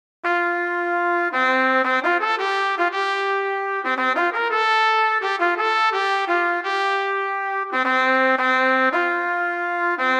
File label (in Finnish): Rotissöörit 112 tempo